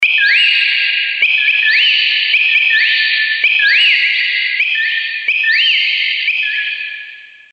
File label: birds